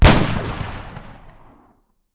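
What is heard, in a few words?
rifle exterior shot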